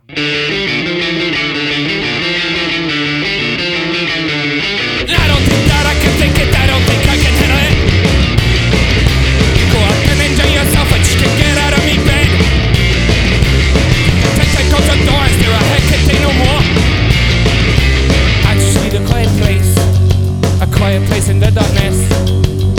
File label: ukulele